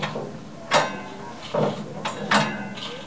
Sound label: turn